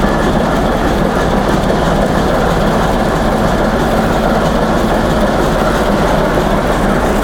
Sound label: diesel